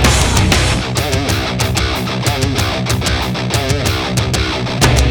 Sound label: guitar